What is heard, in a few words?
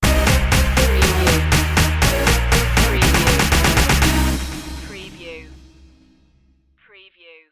sting